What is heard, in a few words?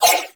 Nunchaku Prime Whoosh; Warframe; Tenno